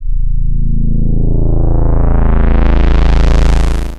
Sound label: electro synth sweep